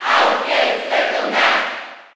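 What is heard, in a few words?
Cheer German, crowd; Little Mac; SSB4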